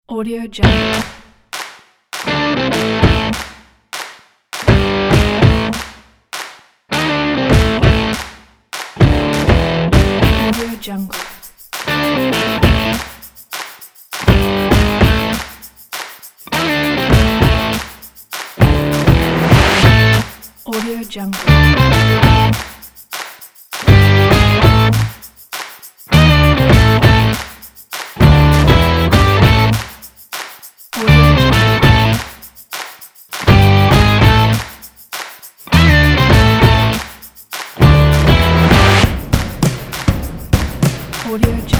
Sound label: energy stomp beat